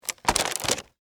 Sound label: Package Set Down, Foley, Package, Set, Down, Wrapper, Crinkle; Plastic; BlastWave